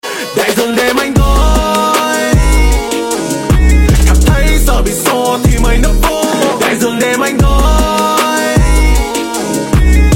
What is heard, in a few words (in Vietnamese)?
rap viet